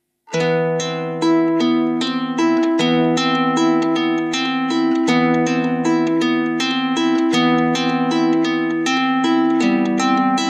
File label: Gusli